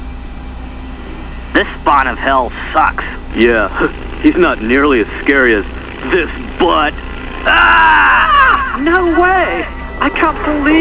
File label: scary